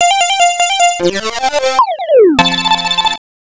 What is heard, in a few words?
Game Over Music; Pac-Land; X68000